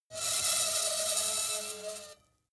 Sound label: metal screech phaser steady short